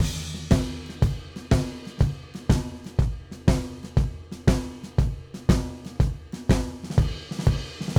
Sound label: Blues Drums Intro; Digidesign; Pro Tools Essential Loops